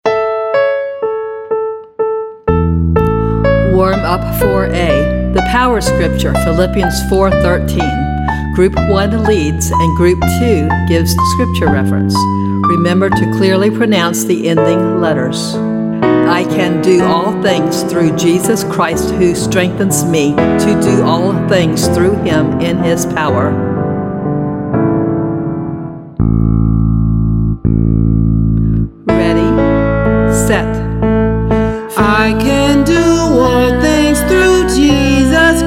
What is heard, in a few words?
Vocal Warm Up